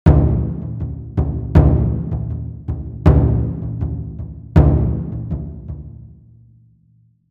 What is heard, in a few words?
Original, Ohdaiko; Ohdaiko 3.4; taiko; TAIKO THUNDER